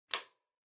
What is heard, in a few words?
Light Switch American Toggle Wall Mid